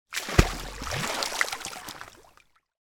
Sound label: water splash small plastic swimming pool; sound effects one